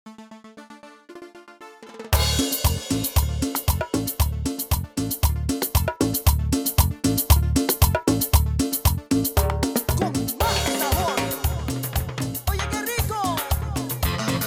Intro Perc 115 bpm

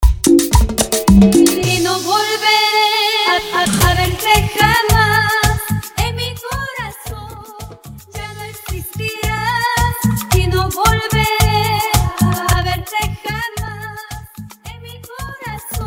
Acapella, 110 BPM

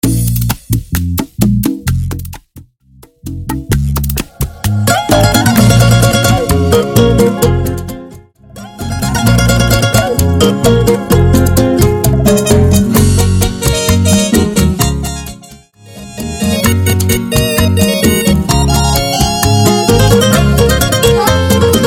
Intro Simple 130 Bpm

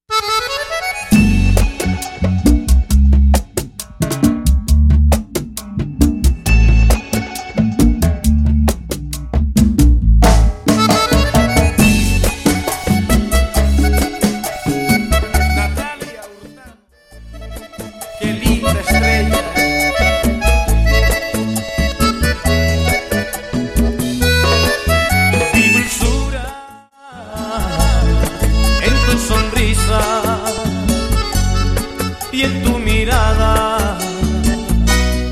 Intro Break, 135 Bpm

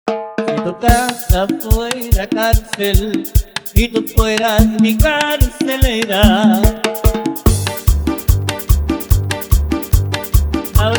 REMIXEADA REQUINTO ORIGINAL, BPM 145; DIMAX DJ MIX

